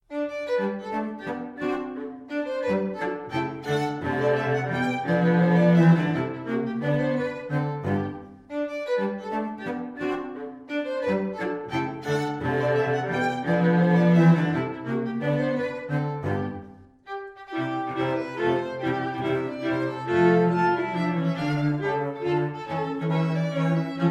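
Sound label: string trio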